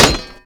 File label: shield hit metal metal; Mount&Blade Warband